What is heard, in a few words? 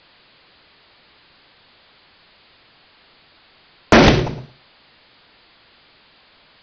bigbang, precision electrolysis; science; chem